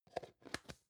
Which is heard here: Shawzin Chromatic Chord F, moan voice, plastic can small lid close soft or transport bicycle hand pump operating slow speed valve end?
plastic can small lid close soft